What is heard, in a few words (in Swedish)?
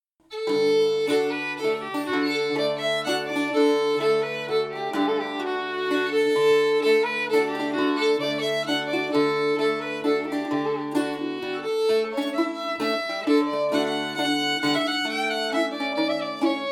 Polska